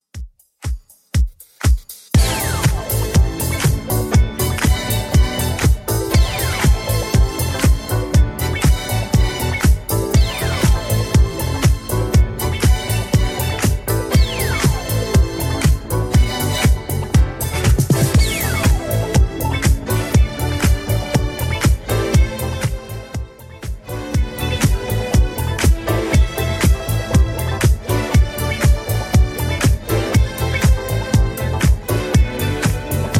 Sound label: 70s Redrum 120bpm